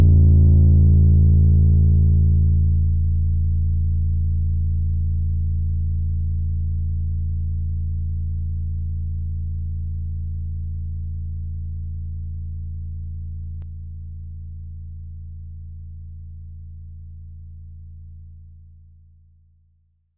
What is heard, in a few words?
Drone; Drones